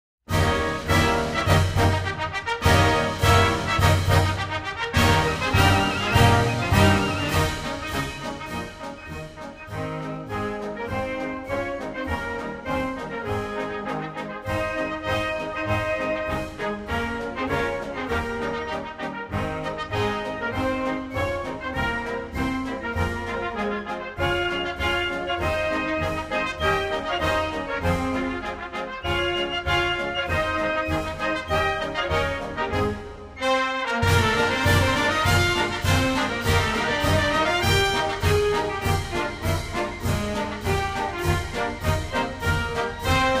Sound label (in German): monaco hymne